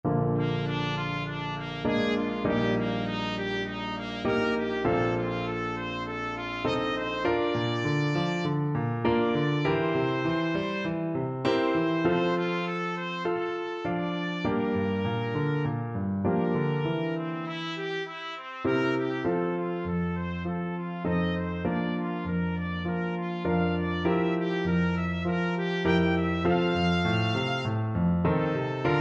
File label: trumpet